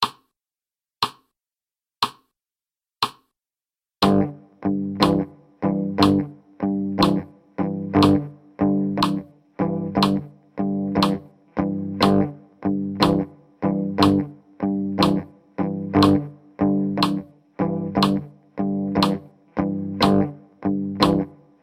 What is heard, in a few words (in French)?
Sol, 60 bpm